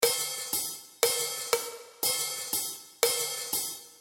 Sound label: rhythm